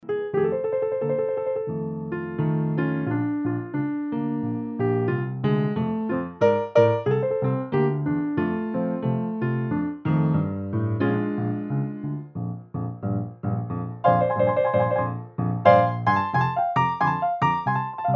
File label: bluthner, piano; best service galaxy piano 2